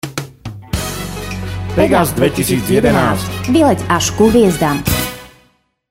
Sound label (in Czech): jingel; pegas hrany